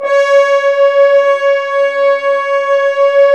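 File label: french horns dry; Roland L-CDX-03 Disk 2; F.Horns 2 f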